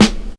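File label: MPC snare, drum kit; USB Soundscan vol.03 - Pure Electro; AKAI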